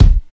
hot kick drum single hit C key

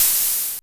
airy open hi hat sample a sharp key

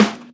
dry snare one shot g sharp key; snare drum one shots

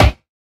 solid snare one shot c sharp key

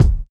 tight low end bass drum single shot b key

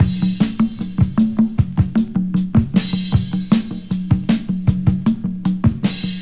103 bpm drum groove f sharp key

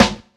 sharp acoustic snare sample g key; Duration 0:01; snare drum samples

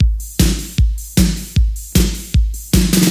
154 bpm breakbeat sample A key; drum loop

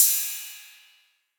crash sample f key

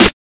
old school wet snare drum g key; Mono

